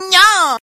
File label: Nyaa